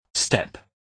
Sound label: step